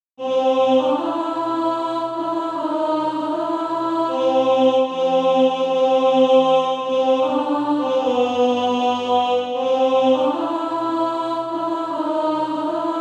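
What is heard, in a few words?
alto, Practise Music; SATB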